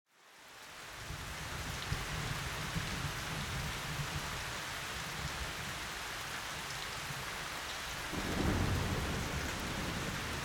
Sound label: nature sound bath thunderstorm